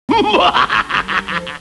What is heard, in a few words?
laugh evil